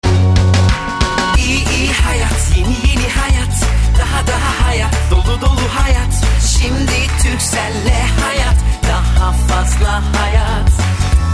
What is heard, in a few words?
Ringtone; Turkcell